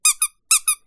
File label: squeak